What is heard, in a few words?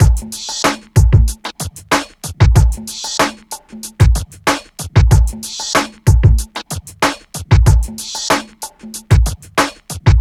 Drums